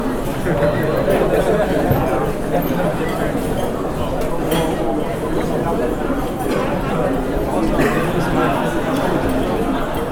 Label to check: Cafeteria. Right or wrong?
Right